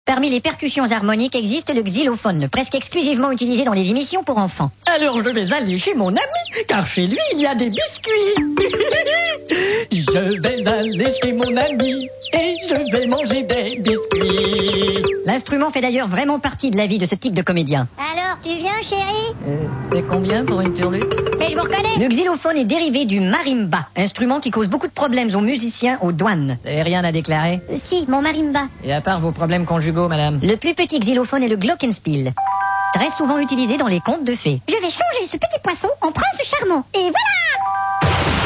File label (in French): Les percussions; musique du peuple